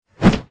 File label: zombi swing, melee miss; the monster plane v2; kolmods